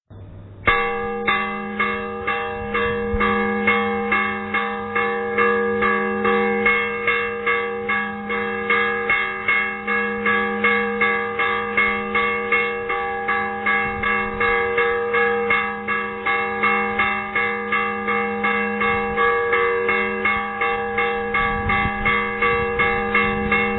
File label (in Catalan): campana